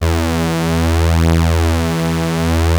OB 1 E3, synthesizer; Hollywood Edge - Giorgio Moroder Rare Synthesizer Collection; OB 4 VOX.

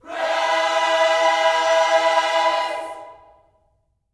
PRAISE, Gospel; Spectrasonics; Vocal Planet; GOSP PHR

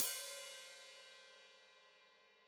Hat Foot Open, Hi-Hat

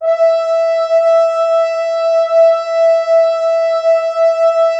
Orchestral Brass

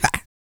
GRUNT, MALE HUMAN; ILIO; Vocal Planet

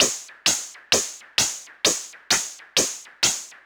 Modular 130BPM

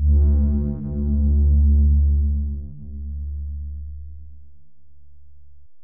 Bell, Alesis DM-PRO

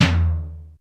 XE8 TOM; Crypton - DrumBase5000

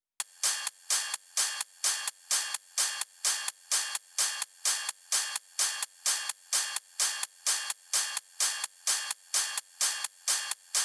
128BPM Top Loop, Drums & Perc; Essential Clubsounds Vol.5; Break the Chains; Root G#min

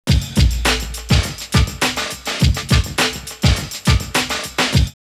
drumloops OldSchool 103; X-Static Goldmine; 90 s Sample CDs

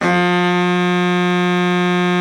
BARITON, BARITON SAX; Best Service; Brass Super Section

